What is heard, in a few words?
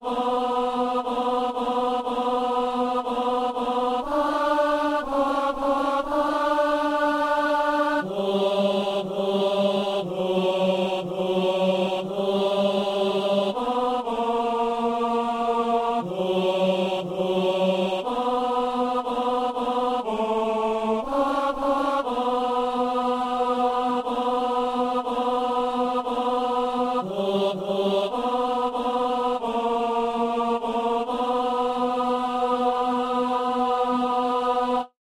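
tenor; Anima Christi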